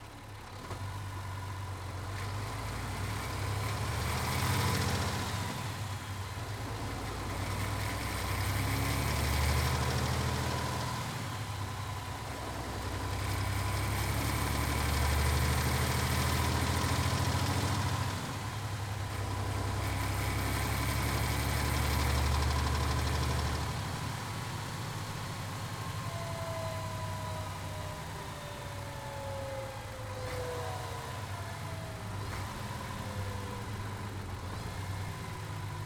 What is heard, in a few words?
man lions coach onbrd drive gearshifts engine; MAN Lion's Coach 2015